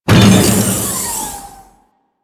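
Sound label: Research Facil Crane Move Vertical Start; Venus; Objective Rooms; Props One Offs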